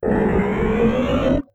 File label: alien sucker